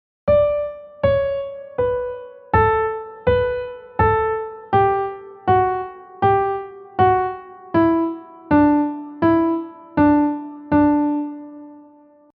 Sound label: Robotic Improvisation